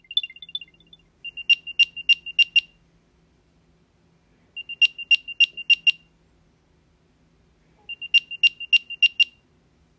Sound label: AirTag